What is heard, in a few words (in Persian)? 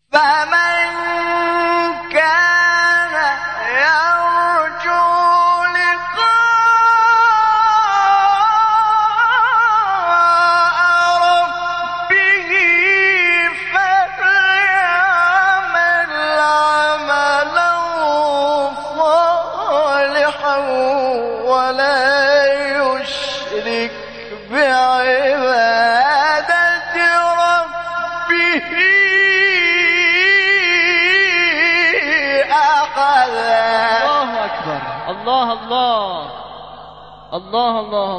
فراز زیبای آیه سوره کهف; آیه 110; حامد شاکرنژاد